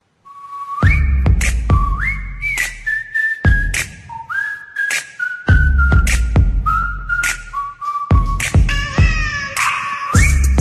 WHISTLE, ringtone

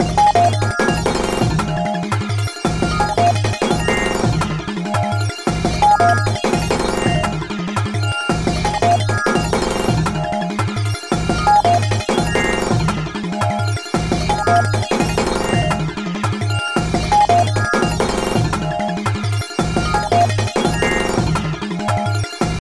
Hover Uplifting Beat

